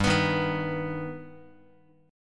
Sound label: G Augmented G Guitar; G+ strummed; x,3,2,1,3,x; JGuitar